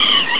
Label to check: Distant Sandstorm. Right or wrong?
Right